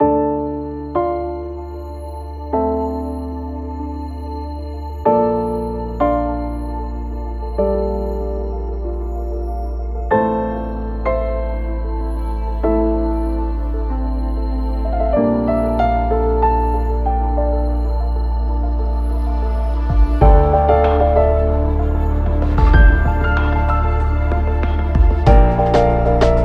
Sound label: Grand S Modern Concert, Piano; e-instruments; acoustic bundle; Session Keys Grand S; pianos acoustiques